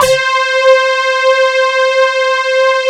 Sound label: DS8 C 3, Korg